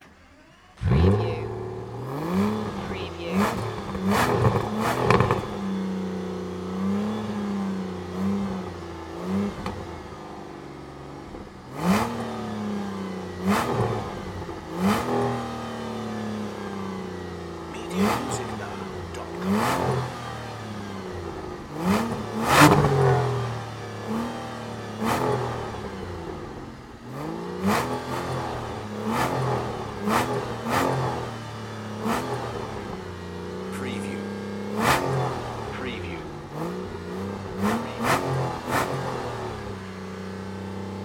Car Aston Martin Db9 Start Up Rev Engine Shut Off Tailpipe Perspective